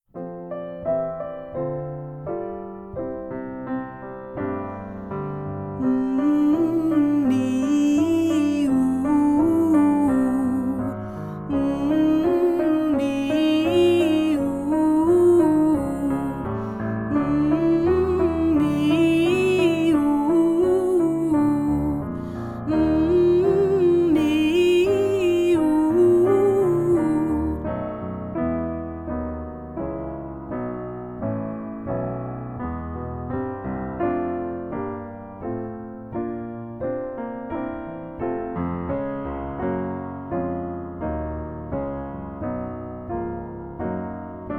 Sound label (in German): Warm up hoch; 03-Warm-up mmmmooooüüüüü; Einsingen hohe Stimmen; Stimmbildung